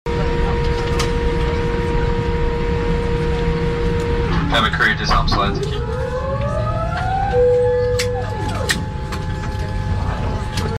Boeing-787; بوئینگ ۷۸۷

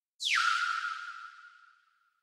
appear

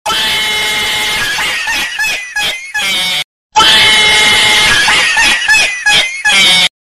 cat laughing meme; میم خنده گربه

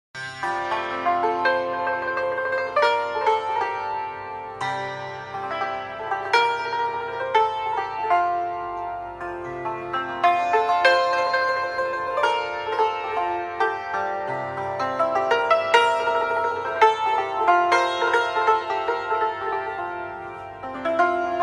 Santour

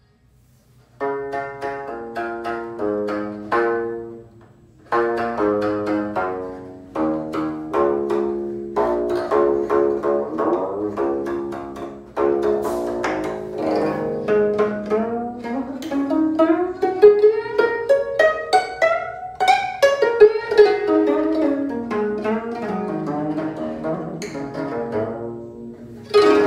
Geomungo, ساز سنتی کره‌ای

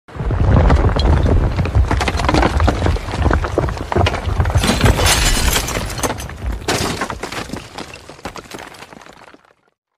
brick

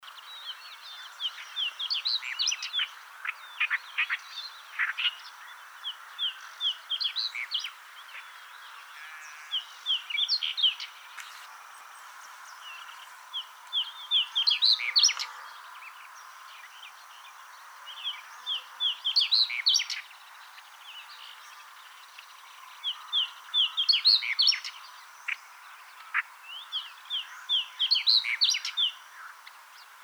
Pied bush chat

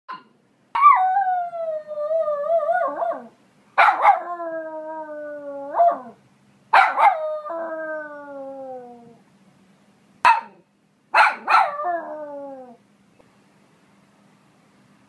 Poodle; سگ پودل

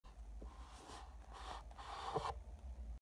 Bookshelf

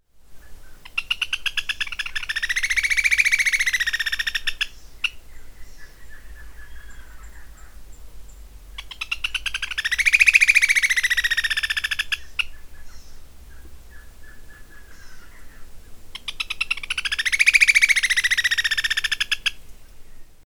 great xenops

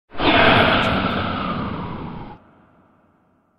Dragon's Breath; نفس کشیدن اژدها

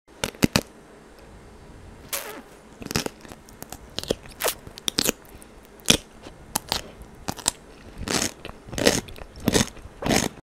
Cucumber

